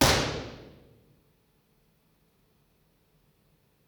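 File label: impulse response stereo; spring lane building university york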